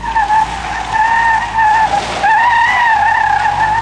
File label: slip; Mafie 1